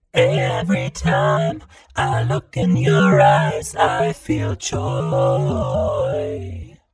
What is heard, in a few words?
FX Modularized; Manipulator